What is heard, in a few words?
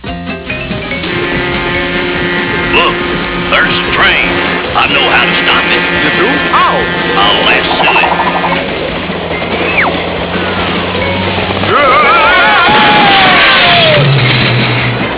train; classictv; hairbear